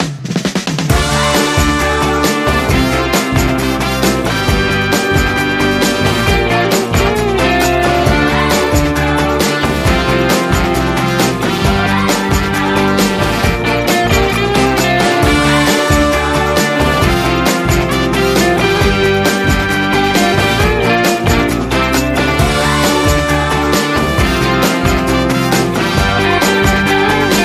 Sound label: Soul Beat, Vocal Alt